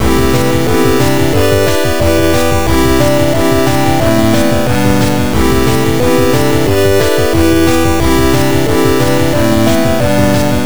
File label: Congrats